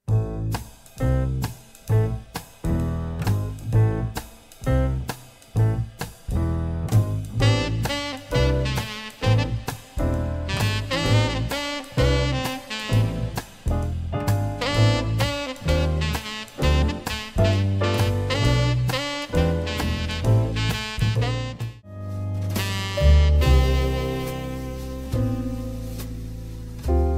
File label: saxophone example, saxophonist